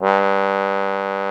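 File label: trombone G1; MONO BRASS; Greytsounds VOL-1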